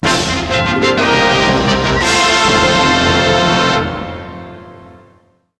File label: Stage Clear; Beat Saber Clear Fanfare; SSBM